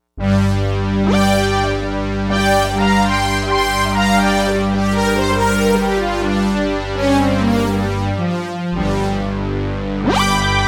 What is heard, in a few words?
Euro rythmix